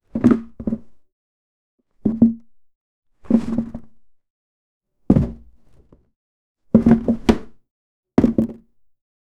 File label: a cardboard box filled wi